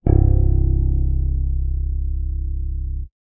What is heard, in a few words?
guitar electronic, clean electric guitar